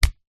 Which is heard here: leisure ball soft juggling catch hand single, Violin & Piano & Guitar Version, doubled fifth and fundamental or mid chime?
leisure ball soft juggling catch hand single